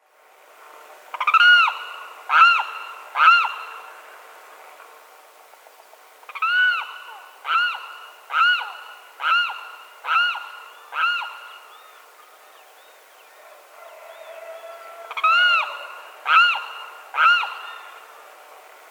Aramus guarauna; Quinto Potrero

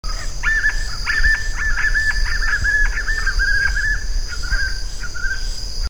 Nystalus chacuru

